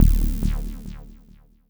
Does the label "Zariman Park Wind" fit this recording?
No